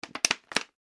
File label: bottle plastic movement; Plastic Bottle Handling Movement; foley; Materials